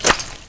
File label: player mud